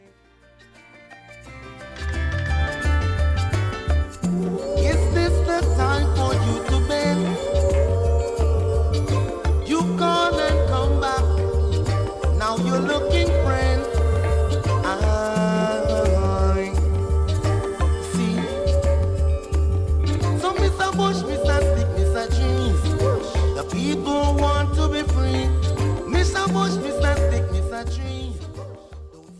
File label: Car Wars (Ambient)